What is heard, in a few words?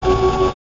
mech servo; Sid Meier's Civilization 4 Complete; Beyond the Sword; Units